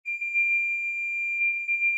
sonar Ping Water Far Beacon Directional; Barotrauma; Real Sonar

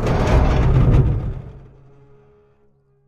structure crunch; Barotrauma; Subsurface; Damage

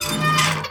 metal walk, Footsteps; Thalassophobia Restored

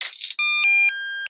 impact mine disarmed; Weapons; EK modern; Barotrauma

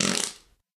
Fart; DynamicEuropa; Hungry Europans

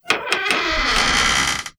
open crate; Barotraumatic

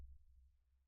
sonar Tail Air Close Beacon; Barotrauma; Real Sonar

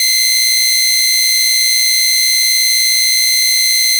Countdown done; Weapons; Explosives; Thalassophobia Restored

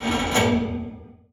structure crunch; Barotrauma; Subsurface; Damage

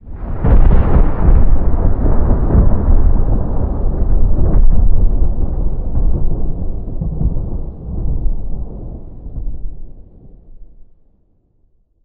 Thunder Large; Ambient; DynamicEuropa

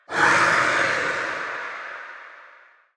eel; Barotraumatic; Thermaleel

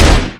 chaingun fire; Weapons; EK modern; Barotrauma

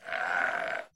clown grunt; Barotraumatic; Deepseaclownnew

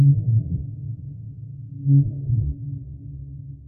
Ice Whale death; Barotraumatic

